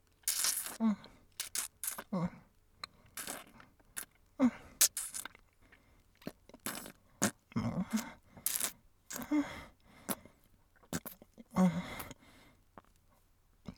moan and suck; astolfo

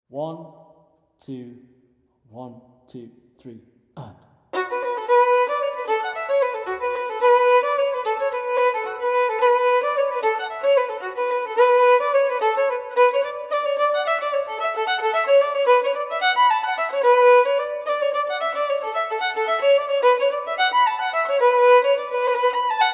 Fiddle Full Speed; The Musical Priest

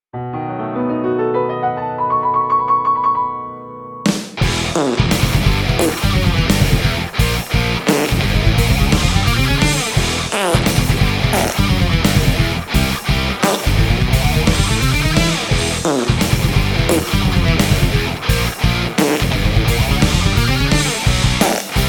Metal Riff With Fart

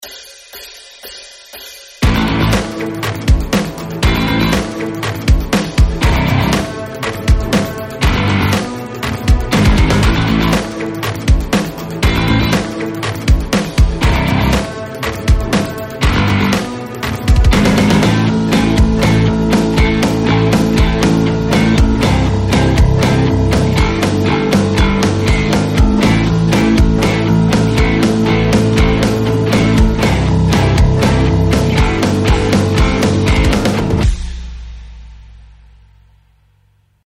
Backing Track 120BPM

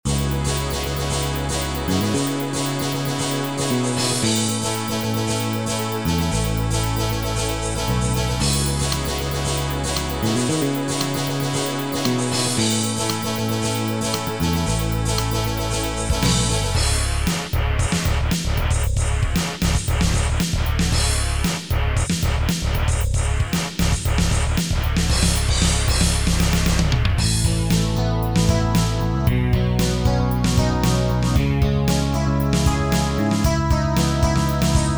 CPS-2 Instrumental Remix; Tekken 5; SupremeMIDI

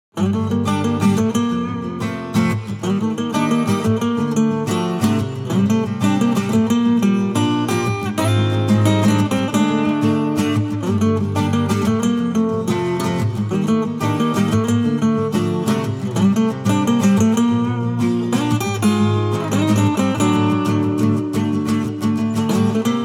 Acoustic Short

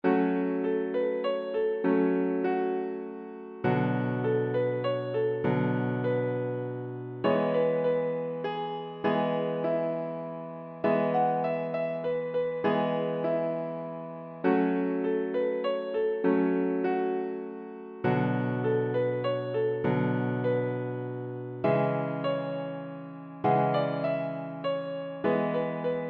Piano and Violin

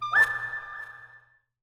distant toot

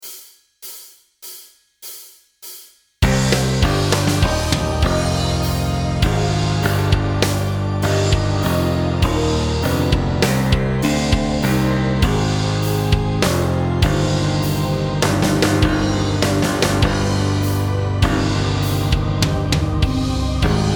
metal guitar counterpoint backing track

